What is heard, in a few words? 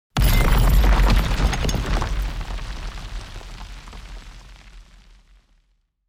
Stone With Chains Is Destroyed